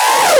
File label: Misc Short FX